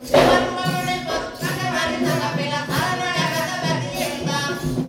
MIX, India

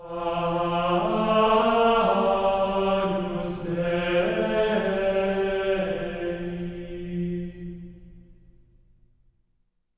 AGNUS F4, Symphony of Voices; Spectrasonics; GR AGNUS

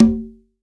CONGA, STEREO CONGA

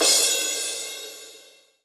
Cym Lo Medium; Alesis D4

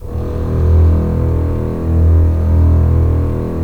STR, Roland LA Composer

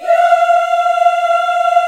F 5, CHOIR YAS, Africa; Spectrasonics; Heart of Africa 1

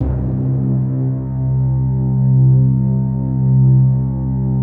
PAD, DIGI-PAD

